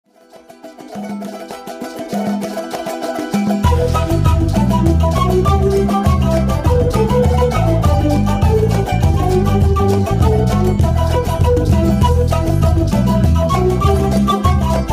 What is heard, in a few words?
Peso Whistle